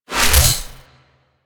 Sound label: Flux Rifle Reload; Crp Laser Rifle; BE Flux Rifle